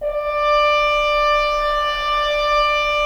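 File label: Solo French Horn; Roland - LA Composer vol. 4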